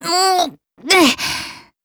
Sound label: climbing; venti